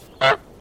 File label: honk muffled